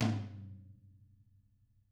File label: TM12HITMPR-L, Double Platinum Drums; TAMBKIT